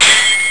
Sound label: sword clash